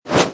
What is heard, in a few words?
throw; merge-dragons-2